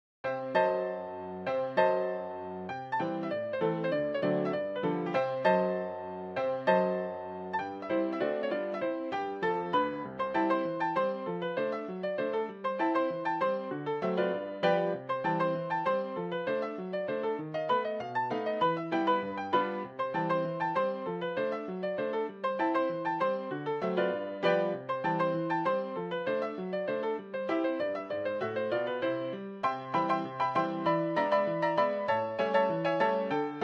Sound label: ragtime